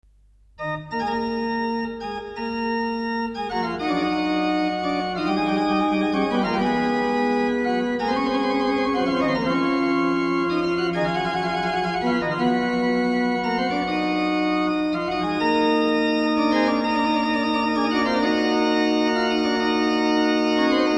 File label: Mass for Organ, Bach Organ; Credo-Wir glauben all' an einen Gott